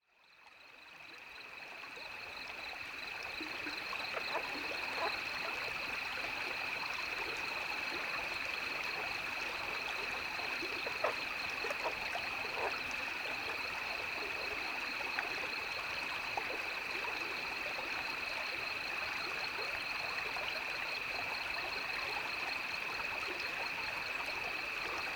Rio Grande Nightsong Insect Lullabies

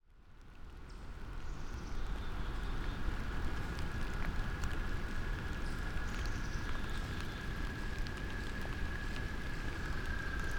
Storm Toads Atmospheric Surround

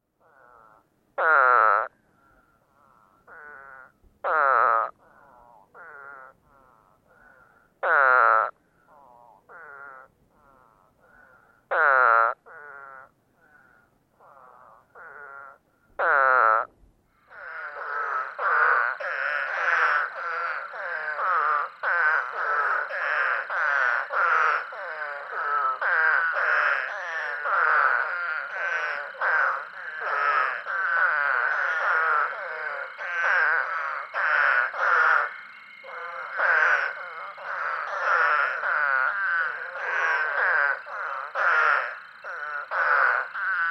scaphiophus holbrookii

